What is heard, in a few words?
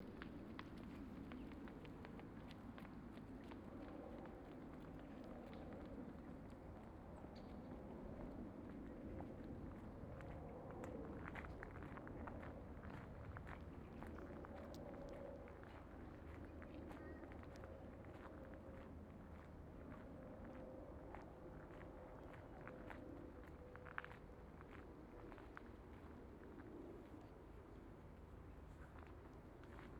park, scenes; IEEE AASP